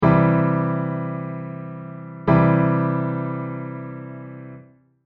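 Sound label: Guitar triad Cm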